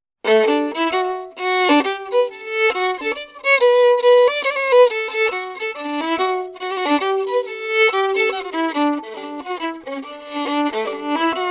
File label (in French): maz violon